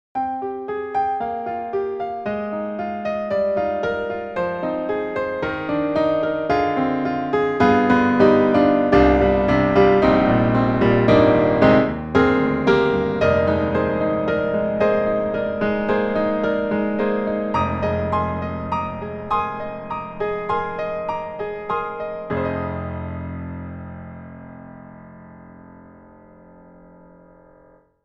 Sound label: Grand Pianos, Bosendorfer, Bosendorfer Recital; Synthogy; IvoryII